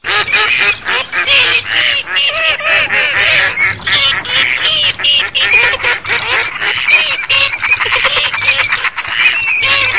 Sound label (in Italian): ALZA MARZA GERMANO